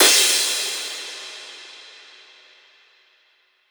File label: cymbal decay; Drum One Shots; SD MIDNIGHT Sample Pack; Slate; Cymbals